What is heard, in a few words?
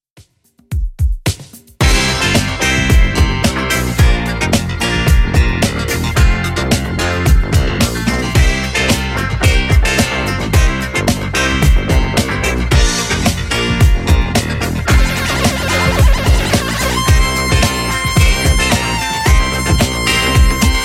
70s Redrum 110bpm